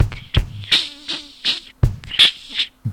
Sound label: Loop